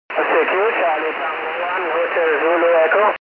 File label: 70200 khz; pe1itr; 70mhz